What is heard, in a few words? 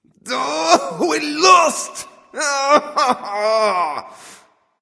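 non-verbal boohoo; smite; voicepack; houyi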